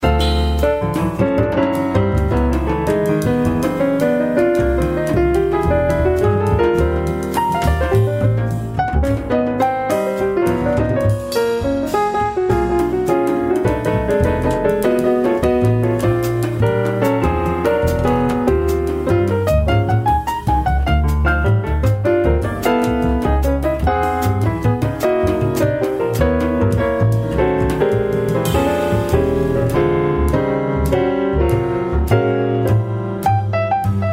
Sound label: jazz